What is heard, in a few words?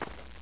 grunt step